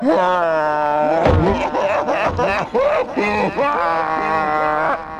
squid idle; catnap n grabpack

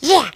hunter pain short; huggy wuggy snpc; elmo

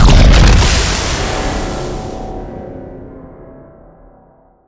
stasis trap; Garry's Mod; sprunki jelly fish

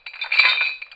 chains; The Monster Plane; warlock golem

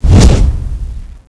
attack, melee miss; The Monster Plane; kolmods

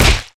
kick body stereo; squid game pro jjk; squid game doll

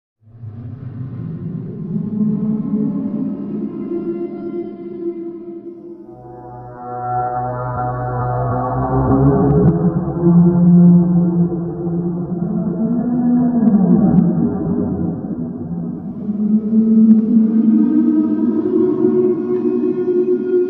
idle loop; sea eater

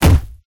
ground punch clown; zoochosis; lazynap pack 2

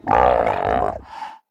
pain, procoptodon; zoochosis pack

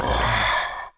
monster; The Monster Plane; kolmods preatt

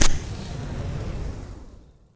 Flare Impact; grabpack upgraded; pp3

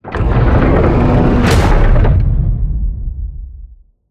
megamek move; skibidi toilet emperor

